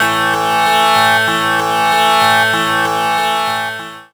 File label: a2, Tabla + Sitar, MIRAGE; ProSamples vol.55 - Retro Sampler